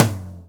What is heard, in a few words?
DRUMKIT T; Keith Emerson Signature Series